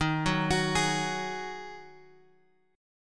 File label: D sharp Minor Double Flat 5th; D#mbb5 Chord {X 6 4 X 7 4} arpeggiated